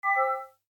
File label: warn